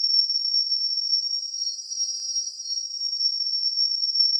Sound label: BRAKES HIGH; AOS F1 2022